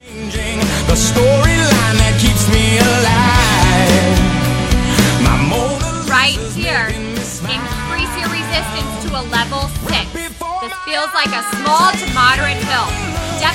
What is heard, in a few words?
Rock Class Sample